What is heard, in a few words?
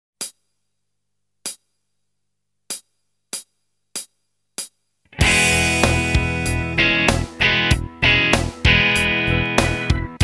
Chord Variations, guitar